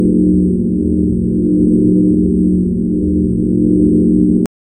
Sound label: portal beam loop